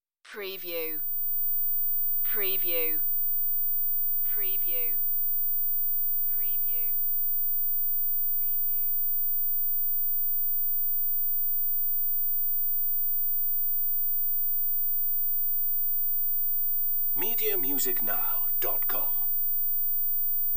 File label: High Pitch Tone